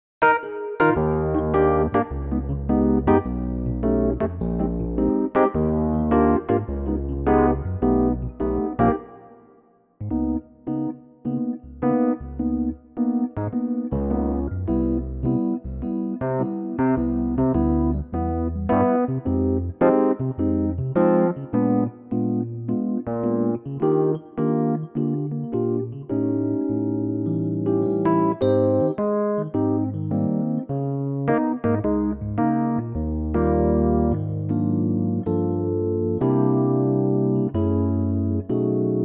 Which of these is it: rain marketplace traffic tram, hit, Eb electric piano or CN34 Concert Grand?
Eb electric piano